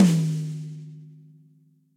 Tom Mid Cold Tight Room, Drum Hits; Standard Hits; Ableton; Factory Packs; Session Drums Studio

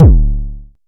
analog pitched, kick; Ableton Foundation FreePacks

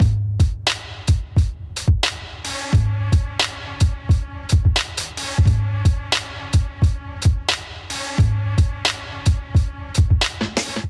Beat 88 BPM, Drums; MIDI Clips; Blueprint; Ableton; Factory Packs; Chop and Swing

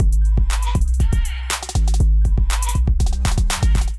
Kit, Drums; Miramar Kit; Ableton; Beat Tools

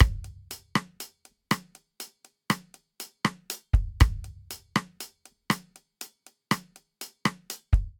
Bossa Nova Intro 120 bpm; 4 4; MIDI Clips; Ableton; Factory Packs; Drum Booth

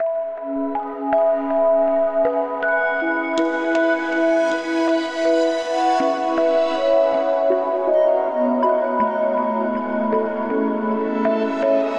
Mysterious Arps - C#min 80 bpm, Atmospheric; MIDI Clips; Tonal; Ableton; Factory Packs; Mood Reel

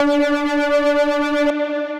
Lead, Synth Lead; Funktion; Ableton; Glitch and Wash

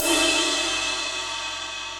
Ride Mid LowLoudSquashed; Drums; Drum Hits; Standard Hits; Ableton; Factory Packs; Session Drums Club

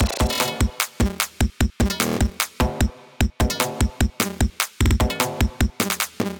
150 Alt Beat, Drum Machines; LD Compuspank; Ableton

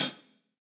Hihat Closed Shank Studio Basic; Drum Hits; Standard Hits; Ableton; Factory Packs; Session Drums Studio

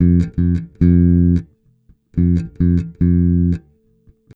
110 Pop Basic Bass F, E-Bass; Pop Rock

